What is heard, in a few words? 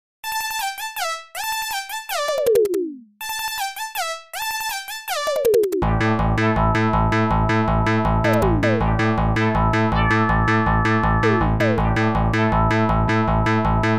msx fm